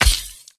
Creepy Hit; game audio; MitaCreepy; MiSide

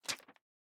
Paper Take Drop; Game rip; MiSide

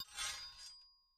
Sword Up; Game rip; MiSide

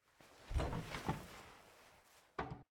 Door Open; game audio; Location; MiSide

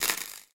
Spark; MiSide

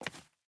Step Heel Marble; MiSide

